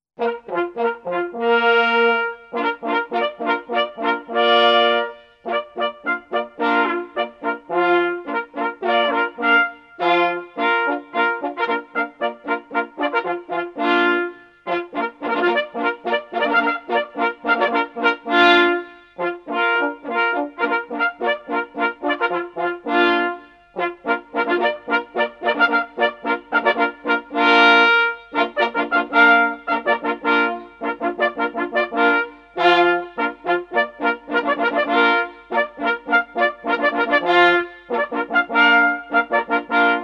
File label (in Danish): dansk jæger polka flerstemmig